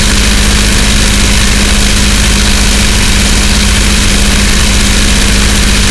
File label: stuka side, engines; War Thunder